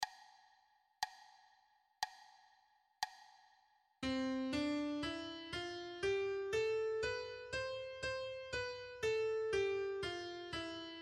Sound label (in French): Octocordes aller retour 60 70 80, flute traversiere